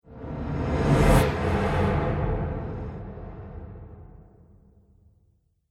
Trailer Hit Crosswind Riser, Sonic Logo Sound Effects